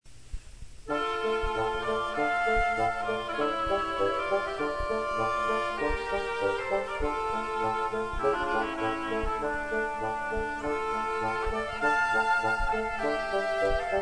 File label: Hungarian Dance, Bassoon Trio